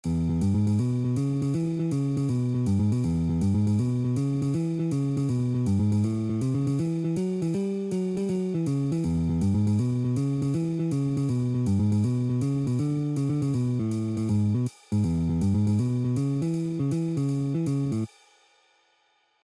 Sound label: Boogie in E